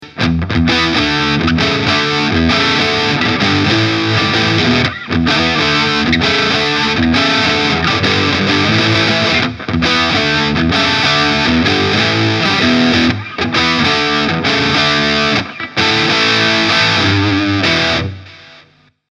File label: Tube Engine JMP; Mooer